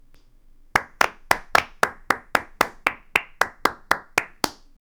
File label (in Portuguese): algum batendo palmas, rhythm